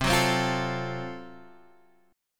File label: F sharp Minor Major 7th Flat 5th C